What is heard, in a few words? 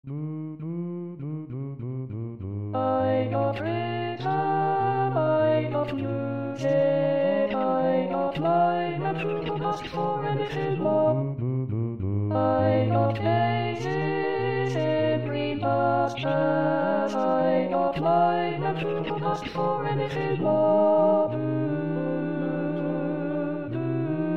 Alto